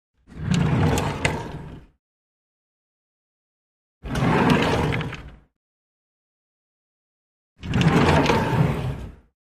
Drawer Wood Open Close; drawers

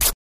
Cloth Rip High

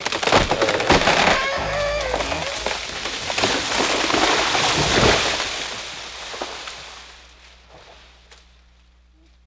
Tree Crack, Fall

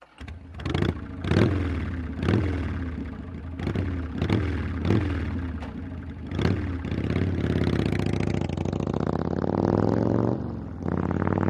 Motorcycles Harley davidson

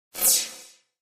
Laser Fire Tap

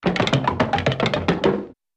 Boots Running Down

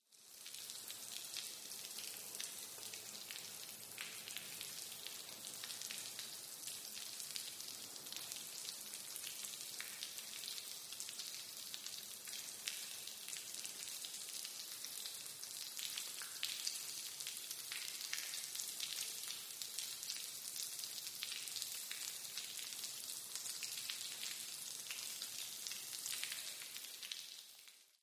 Water Spray Drops, sprays & hoses

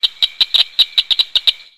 Frog Cricket Chirps

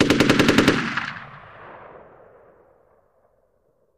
Machine Gun Sngl Rap, single burst; Machine Gun Single Rapid Fire Burst

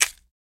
Bone Crab Shell Break

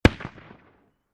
50 Cal Pistol Shot From Distant Point of View

